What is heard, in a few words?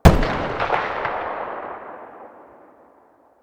muzzle sv98 mono far